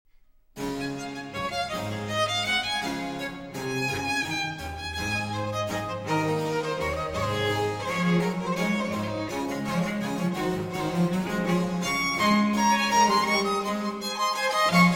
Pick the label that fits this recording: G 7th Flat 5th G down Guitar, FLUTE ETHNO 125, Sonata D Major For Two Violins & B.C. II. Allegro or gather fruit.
Sonata D Major For Two Violins & B.C. II. Allegro